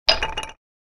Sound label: enter